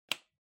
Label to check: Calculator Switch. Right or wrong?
Right